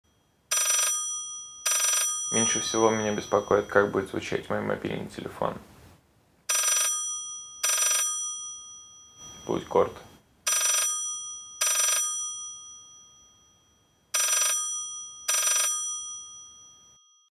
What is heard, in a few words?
ringtone